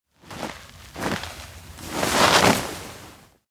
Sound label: snow approach; anemoi